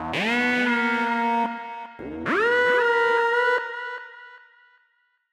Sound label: synth